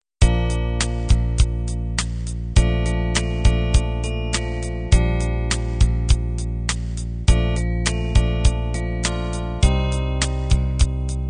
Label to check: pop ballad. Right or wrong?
Right